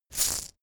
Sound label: insect shaker hit single hard